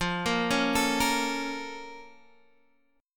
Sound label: Bb Minor Major 7th